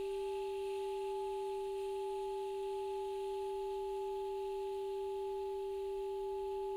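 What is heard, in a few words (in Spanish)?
Multiarmonicos, saxofón multifónico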